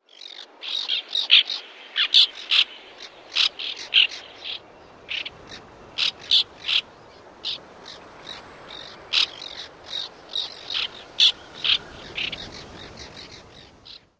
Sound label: typical calls